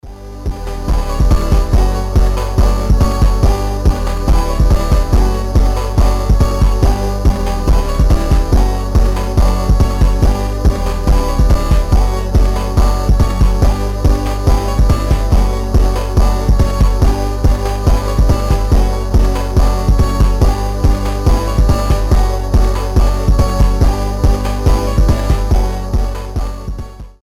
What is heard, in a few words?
slowed